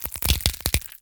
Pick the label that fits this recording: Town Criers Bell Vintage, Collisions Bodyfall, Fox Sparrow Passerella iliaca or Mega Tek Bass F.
Collisions Bodyfall